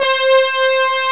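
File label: analog brass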